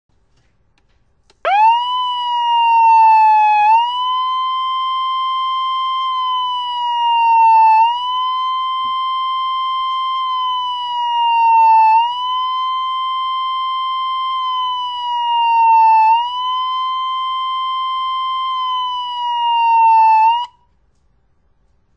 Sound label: siren